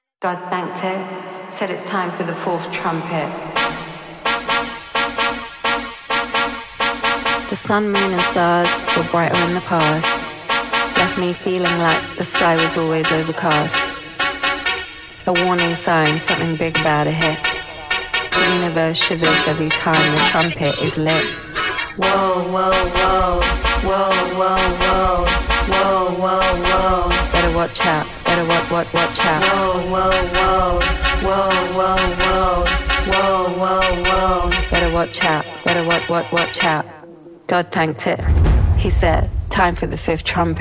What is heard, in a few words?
TRUMPET